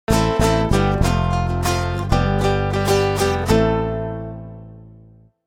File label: JINGLE; ilocto Europe; music production